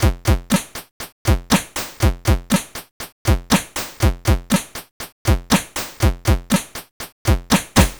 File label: C64 Beat, chiptune drums; Commodore 64